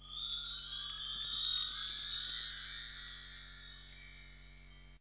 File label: CHIMES, Ragga & Dub Reggae; PERCS KIT; USB Soundscan vol.27; AKAI